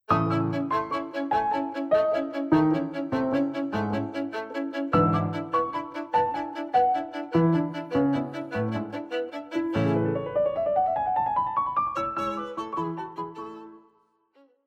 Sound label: Beethoven Violin Sonata; op12 no2 movt1